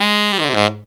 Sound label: TEN G# SF, Phantom Horns TENOR FX; Zero-G